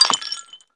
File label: GET BOTTLE